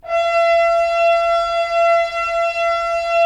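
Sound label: Solo French Horn, brass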